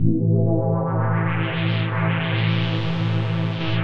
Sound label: DEEPHOUSE ORGAN 125 A